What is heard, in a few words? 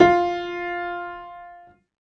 Piano, Harmonic